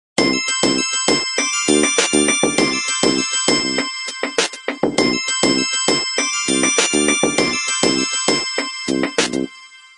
Blink, ringtones; Okapia; Spark

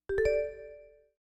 New Mail; Asus; Zenfone-3-Deluxe

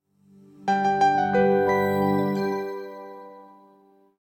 Power Off; LG; Optimus-L9-P769; TMUS

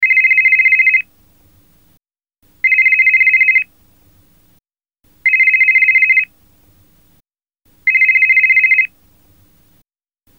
Basic tone, ringtone

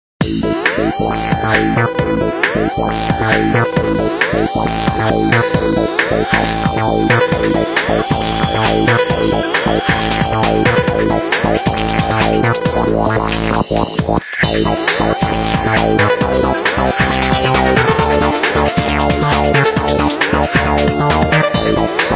Stinger, Nokia Ringtones; 7373 Southeast Asia

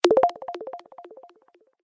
Wavelet, notifications; Infinix; XOS 10

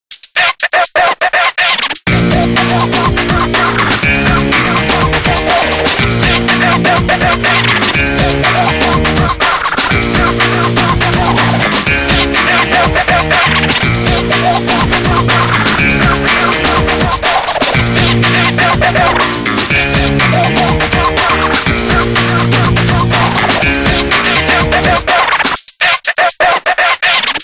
Ring, Windows Mobile ringtones; Microsoft; Dust

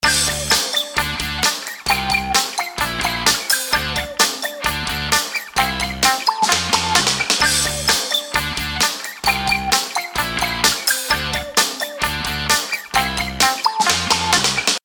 Tone, phonetones; Personal Argentina

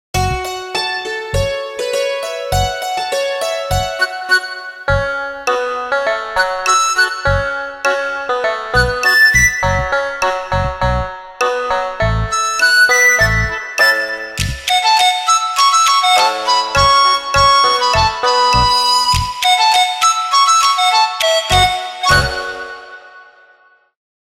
Nokia Tones; Windows Phone apps

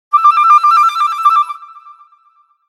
Bird, Nokia Tones; Windows Phone apps

